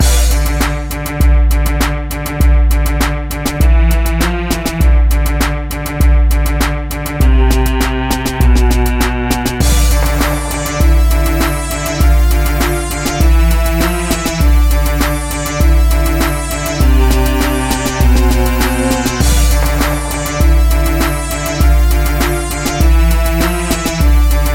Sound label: Rap Beat Ringtone